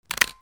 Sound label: CAMERAS